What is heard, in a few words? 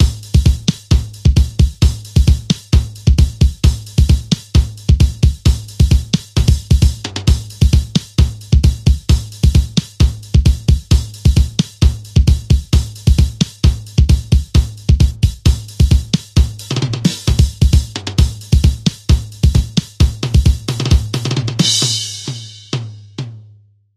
drum